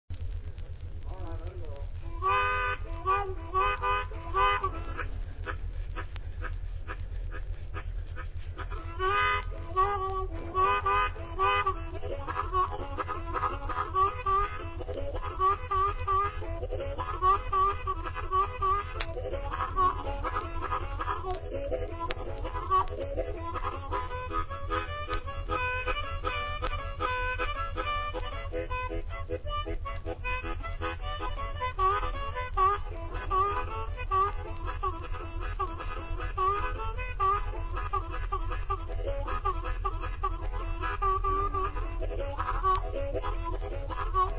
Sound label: Train